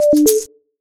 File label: Phone Chime